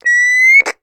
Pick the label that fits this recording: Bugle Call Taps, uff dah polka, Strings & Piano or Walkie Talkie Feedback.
Walkie Talkie Feedback